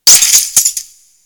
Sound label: Broken Car Window